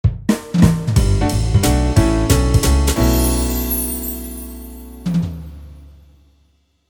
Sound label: jazz jingle; easyrpg; WitchsHeart